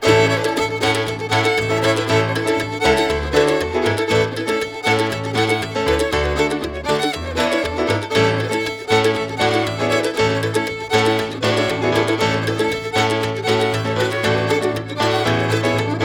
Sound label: mando chops